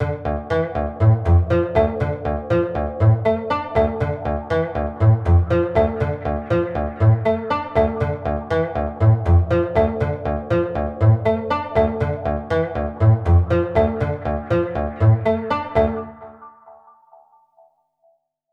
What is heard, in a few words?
Melody, Akai Pads; Mule